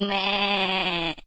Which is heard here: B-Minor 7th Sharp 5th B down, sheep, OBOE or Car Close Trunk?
sheep